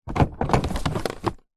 household mower electric grass catching basket attach hook on; zapsplat